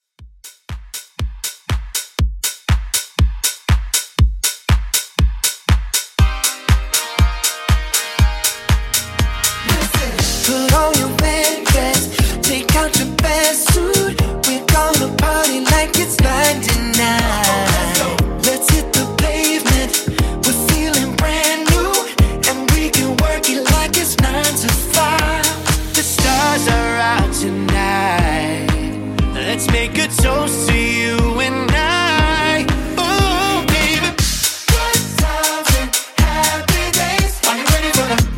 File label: Christmas Redrum 120Bpm